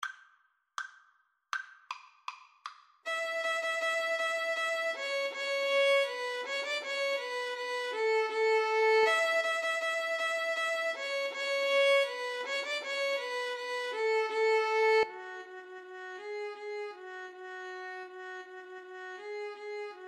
duos marcia, Violin Viola Duet

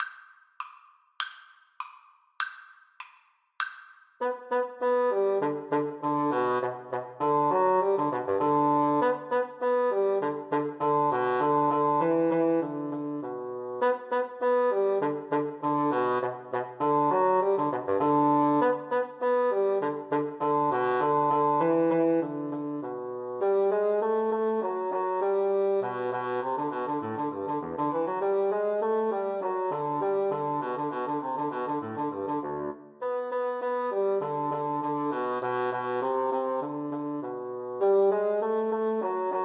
duos allegro, Bassoon Duet

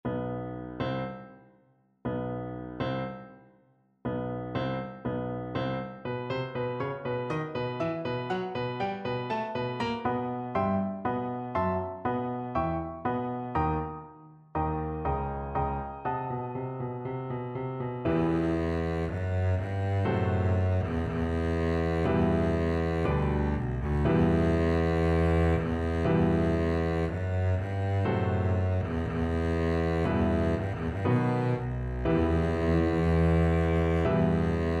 dvorak new, Double Bass

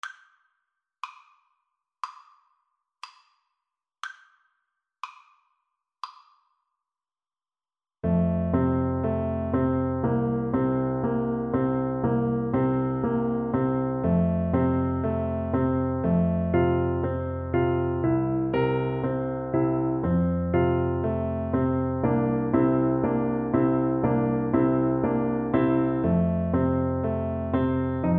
piano duet

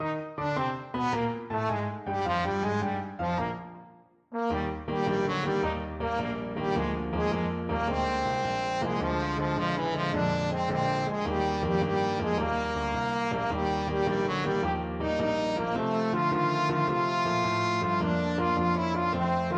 trombone

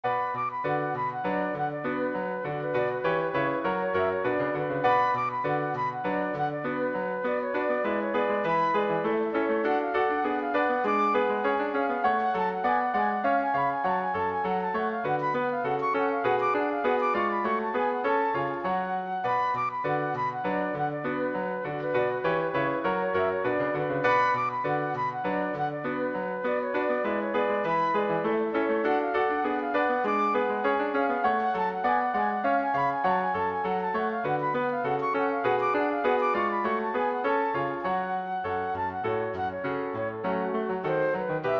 badinerie, flute

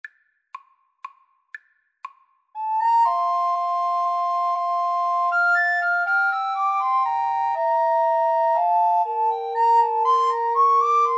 haydn minuet, Recorder Trio

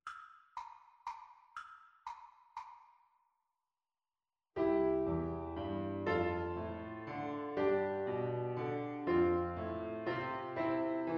Tin Whistle

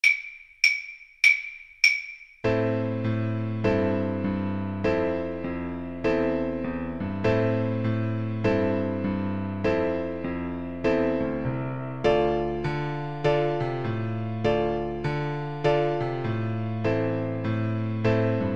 blues, trombone

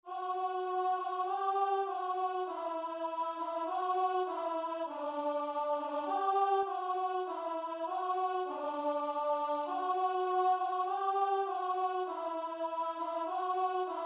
all to jesus, guitar and vocal

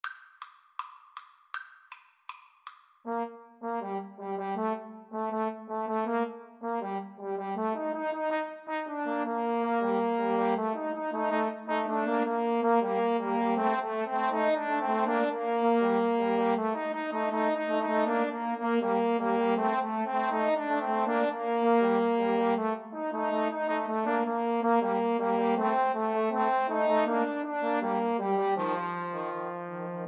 trombone trio

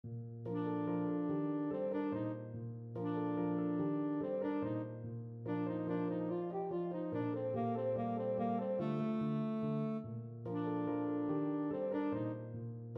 tenor saxophone

